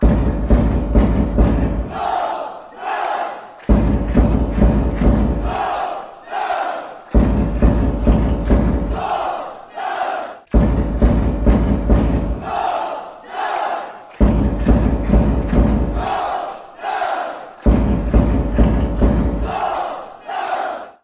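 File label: drums, football fan chants; seongnam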